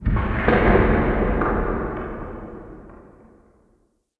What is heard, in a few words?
Under City Alley Distant Rock Crumbles; Ambience